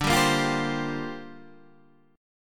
Bb 6th Add 9th D

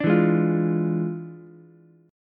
E 7th Flat 9th E down Ukulele C6; strummed; chord; E7b9 chord diagram; JGuitar

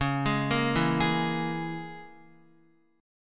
Db Minor 6th Db down Guitar; Dbm6 arpeggiated; JGuitar

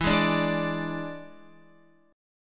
E 7th E down Guitar; E7 strummed; JGuitar

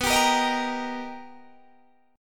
B Augmented Major 7th B Guitar DADGAD, chord

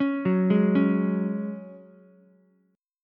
Gb Suspended 2nd, Ukulele; arpeggiated; 6,6,4,4

